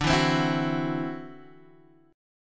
E 7th Suspended 2nd Sharp 5th D, chord

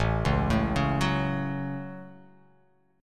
A 6th, guitar

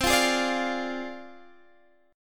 Ab 6th Flat 5th C

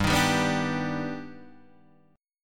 Eb Augmented Major 7th G

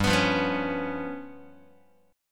G 7th Flat 5th G down Guitar; strummed; JGuitar

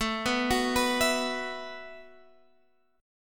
E Suspended 2nd Suspended 4th A

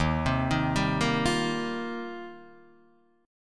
D sharp 6th Add 9th, Guitar, Open D

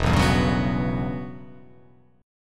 E Minor 6th Add 9th chord

